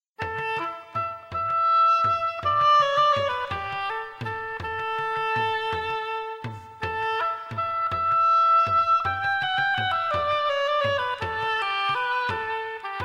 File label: Flute